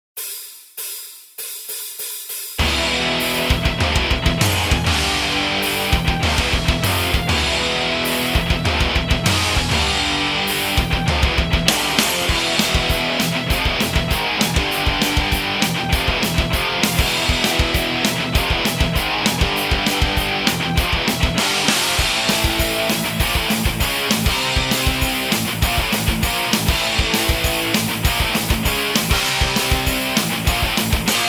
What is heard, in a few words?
bass metal, backing tracks